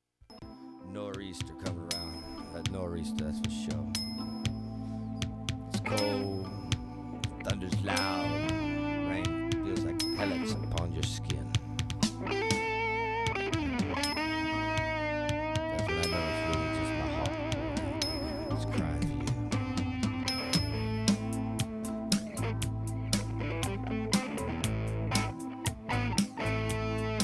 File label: Blues Rock